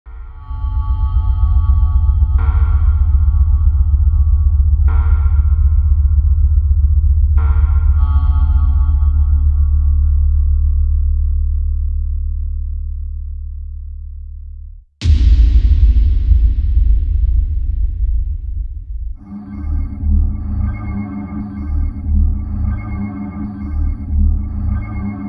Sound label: big bang